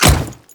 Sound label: Step; Warframe; Grineer; Royal Guard; Footsteps